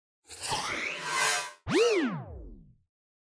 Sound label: Swell Feedback Rear To Front; Blastwave